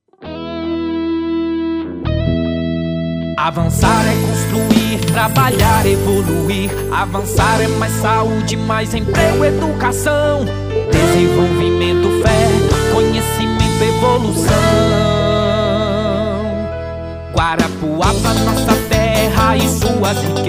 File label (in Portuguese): jingles politicos; Cesar Guarapuava 23; Studio Lako